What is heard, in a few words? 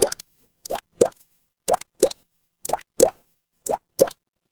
water drip